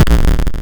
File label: Explosion